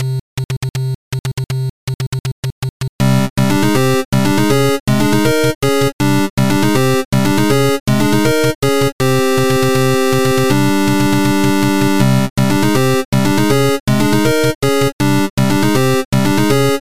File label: BGM Airship Play Hurry; Super Mario Maker